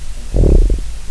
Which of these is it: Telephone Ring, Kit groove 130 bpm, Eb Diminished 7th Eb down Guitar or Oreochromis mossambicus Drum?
Oreochromis mossambicus Drum